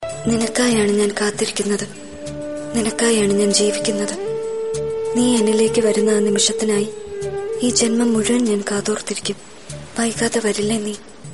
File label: Malayalam mashup love mashup